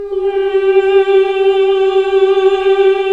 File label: Nuns Mmmhh; Sound & Vision - Gigapack II